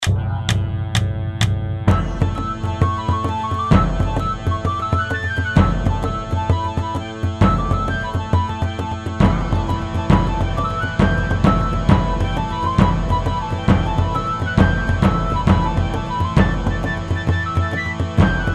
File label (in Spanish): Danza